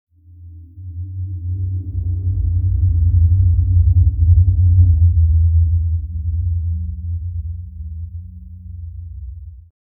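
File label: a deep mystical soundscape; altar; SFX Engine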